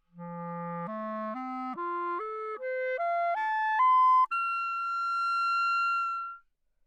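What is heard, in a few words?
classical clarinet c, arpeggio ascending, Anechoic; OPENAIR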